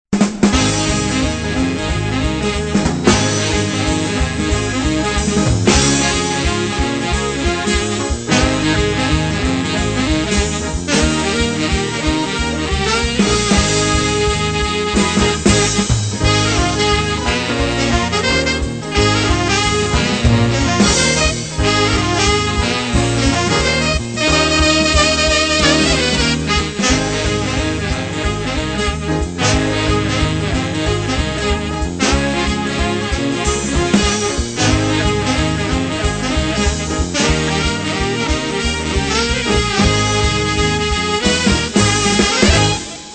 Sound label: Big Band Swing